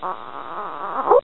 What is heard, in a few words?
Weak cry, Infant